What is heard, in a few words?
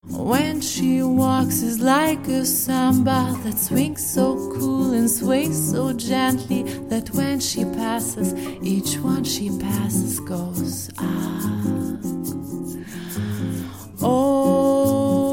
easy summer latin jazz trio; Musikagentur berlinklang; Live-Musik